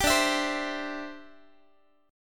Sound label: D 7th Suspended 2nd Suspended 4th D, Guitar; D7sus2sus4 strummed